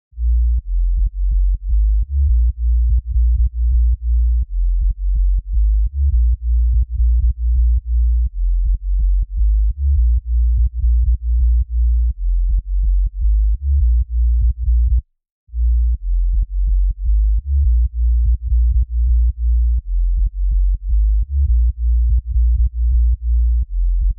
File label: Sub Bass, Future House 125 BPM A#; Big EDM; Future House Tools; Construction Kits; Clap That